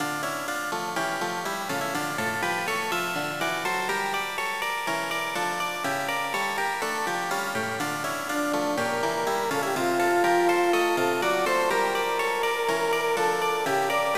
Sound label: In-Game Music; Shinri Game 3 1995 SNES